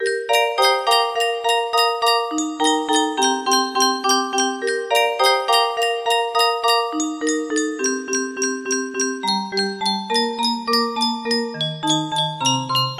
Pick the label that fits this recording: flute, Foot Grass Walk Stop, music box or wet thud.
music box